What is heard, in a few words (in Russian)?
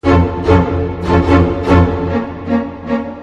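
оркестр струнные интригующие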